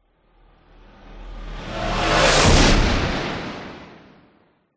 science fiction car vehicle drive zoom past engine rev sonic boom pass